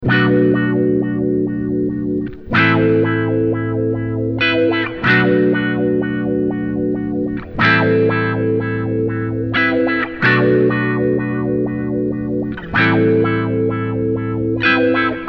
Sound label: Vision Wah 2nd button left